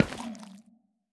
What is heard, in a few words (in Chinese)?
幽匿催发体踩踏声